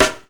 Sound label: SD FUNK, SNARES; E-Lab; X Static Goldmine 2; akai